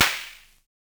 Snare, Univox SR-95

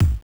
Kick, Genesis; TMNT Tournament; M8

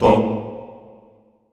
CHO, Roland

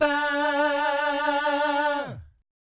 VIB FX, Vocal Planet Jazz & FX; ILIO; JAZZCOMBO

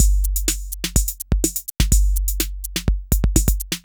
Beat, Drums & Percussion; Electrocution; Beats

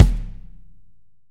AMB KICK; ST AMB KICK

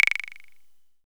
VOODO FX 2

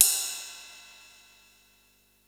ride, Yamaha RY-8

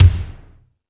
Metal Drums, E-MU Pro-Cussion

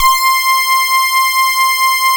LEAD BRS C6; Drums In Your FACE!; LEADBRASS

